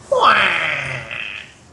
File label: huaaaaaaaaaaaaaaeeee